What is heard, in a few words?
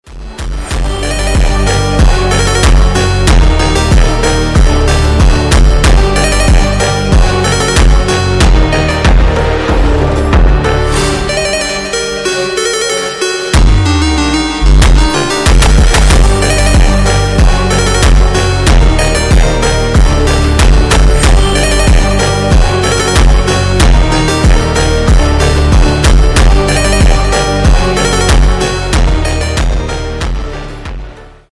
slowed reverb